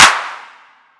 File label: Clap